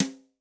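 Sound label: Brush Open, Yamaha DTXpress-II snare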